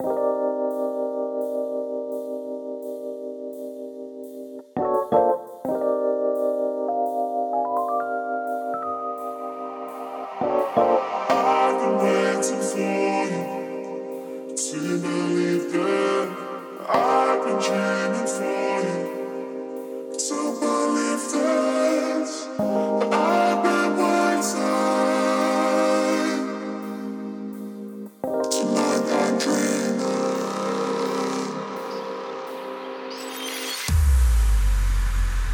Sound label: Future Bass